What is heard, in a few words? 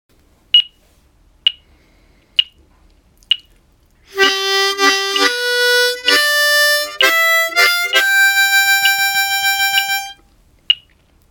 Boogie tongue slap